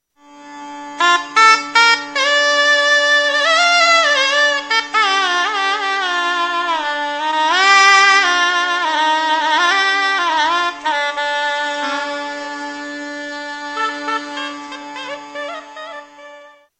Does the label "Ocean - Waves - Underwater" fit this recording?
No